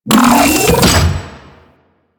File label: Shell Pillar Spinning Ball Activate; Warframe; Corpus Railjack; Comet Shredder